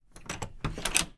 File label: household door hotel room lock or unlock